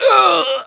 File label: Henchman Death; Playable Tutorial Level; TR2